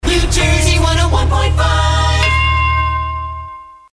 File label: Chime; New Jersey 1015